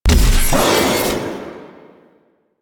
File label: Backstab Self Impact; Warframe; Warframes; Pax Duviricus